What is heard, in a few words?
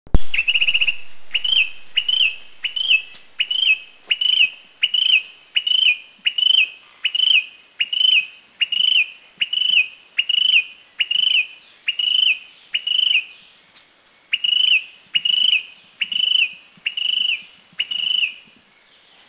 Philippine Tailorbird Subic, Philippines; Philippine Tailorbird Orthotomus castaneiceps Country endemic; 2 birds performed very well in Subic.